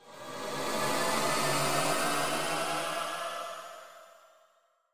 free Spin Riser; dracula

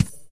reel stop; fairymirror

